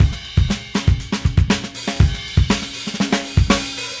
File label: Funky Drums Crash Fill; Sonic Foundry (Sony Creative Software); What it Is! 70s Analog Funk; The Funky Mama